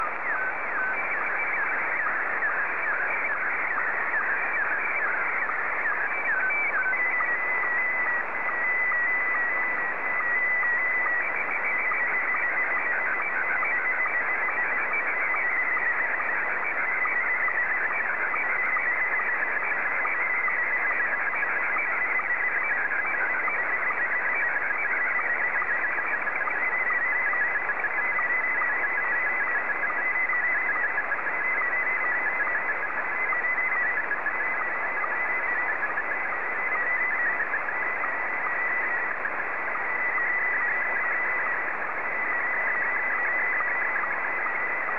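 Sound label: SSTV, Scottie 2; JA3OEN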